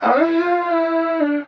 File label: vocal playful Dm; SD AURORA Sample Pack; Slate